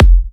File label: Kicks F; markus hakala